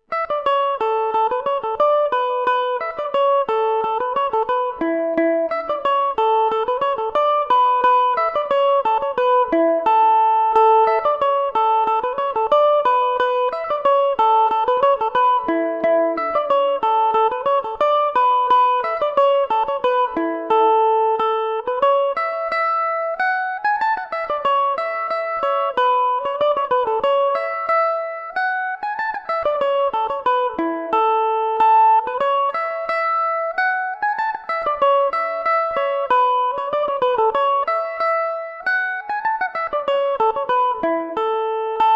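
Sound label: polka A major revelation, Irish mandolin; buddy fureys polka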